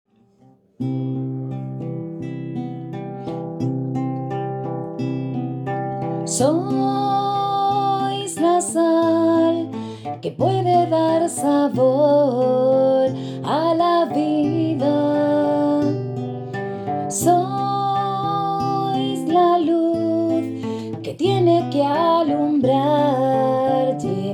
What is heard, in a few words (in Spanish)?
Sois la sal guitarra